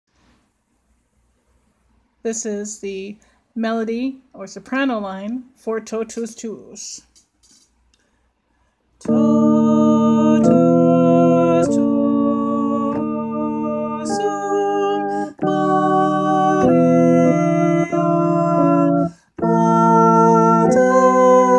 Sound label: Melody Soprano; Totus Tuus; Ordinary Time